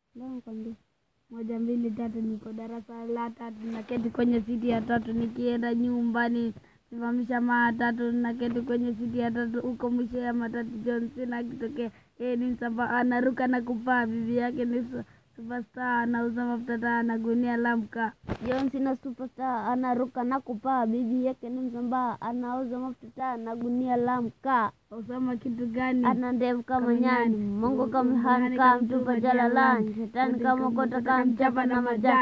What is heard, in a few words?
rap